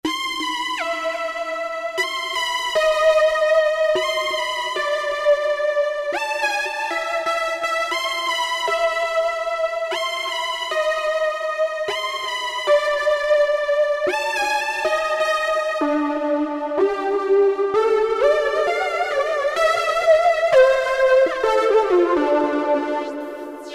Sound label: Legato Lead, Novation XioSynth; Novation XioSynth 25